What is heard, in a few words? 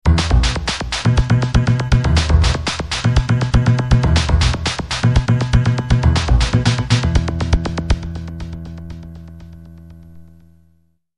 jingle